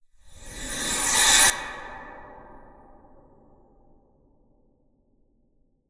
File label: Naga Ice Spell